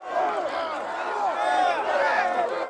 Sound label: fight; Sid Meier's Gettysburg Rebel Demo